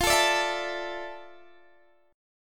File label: D Suspended 2nd F; strummed